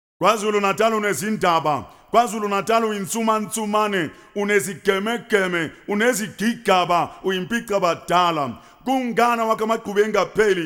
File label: Kwazulu Natal Vocal